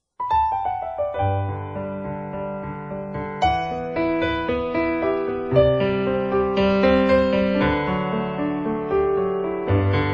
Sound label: Piano